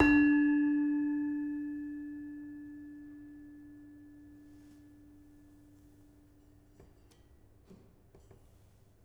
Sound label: Demung soft, gamelan